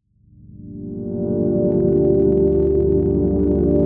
122 BPM Pads Amaj Gmin Tonic G, ambient synth pads; MMB